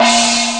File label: monster gong; ST-XX Amiga Soundtracker Sample Packs